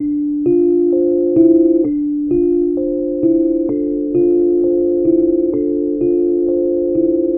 rhodes loop dark low, 130, C

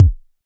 Synth Kick

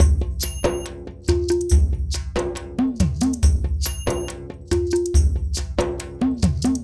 140 Mixed Percussion

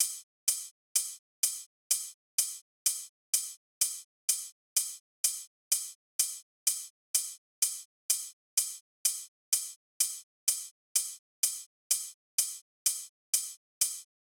Drums Top, 126bpm

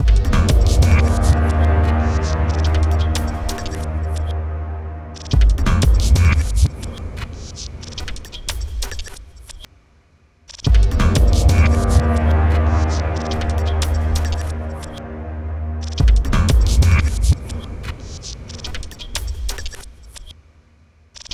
90 D m Drums

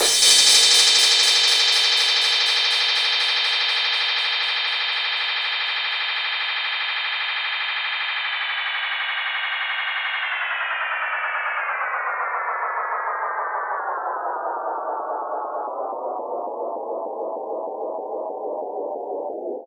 Crash Fx

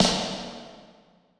Snare 127

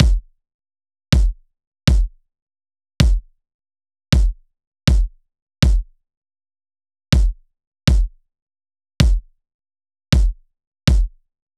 Loop Kick 160 Dm

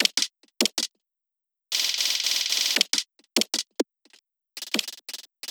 BREAKS, BREAK ARTIFACTS, 174

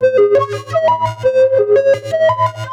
175 G Chopped

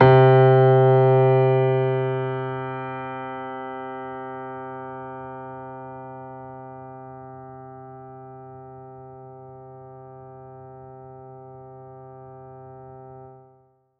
MediumPiano C2; ChainPop

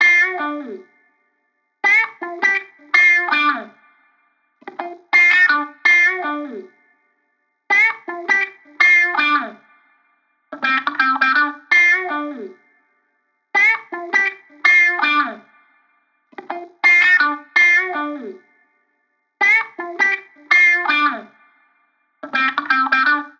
Electric Guitar Loop Groove D m

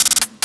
140, Stutter Drum

